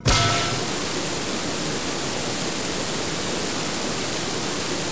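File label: crack pipe loop; water splashes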